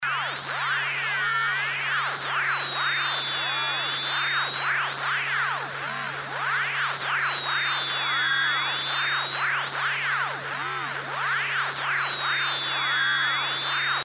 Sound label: Ableton Operator Chaos Pad